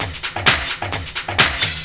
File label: Dance Grooves